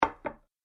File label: Large Dinner Plate Placed On Table; Dishware Sound Effects; Household Sound Effect; Andrew Fleming